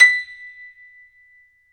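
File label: piano, Grand piano; Club-50 - Foundations Roland; Grand Pno 1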